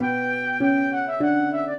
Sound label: minuet, flute harp